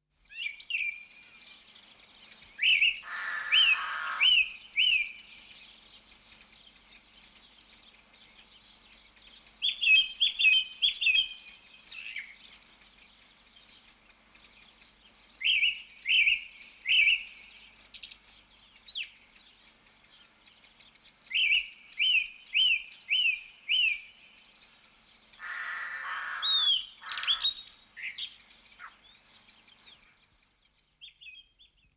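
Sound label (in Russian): приятная атмосфера в лесу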